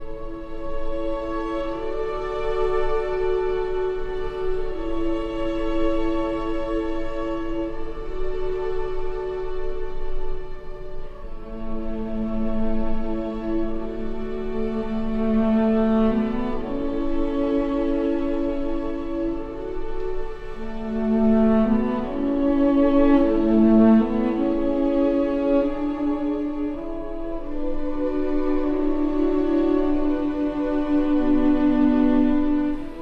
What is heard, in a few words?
for cello and strings; Archi di Santa Cecilia